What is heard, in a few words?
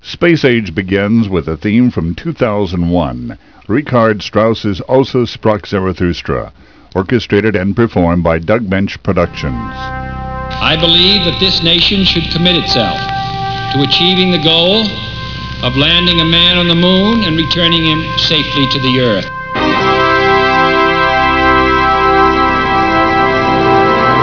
open